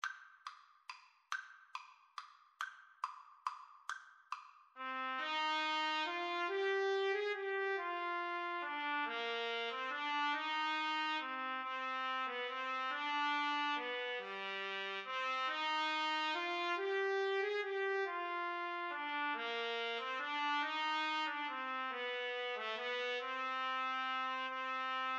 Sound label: trumpet cello, Trumpet-Cello Duet; greensleeves